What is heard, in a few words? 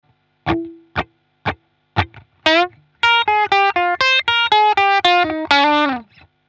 blues, guitar